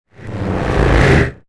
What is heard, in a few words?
Military Motor Flyby Whoosh; whoosh sound effect